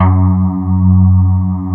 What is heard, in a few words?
STRIA, Twisted Textures; STRYNGI